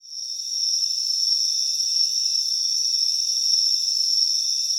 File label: ATMOPAD; Best Service; XX Large; Pads